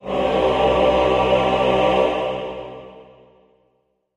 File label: Music Trans M Choir, choirs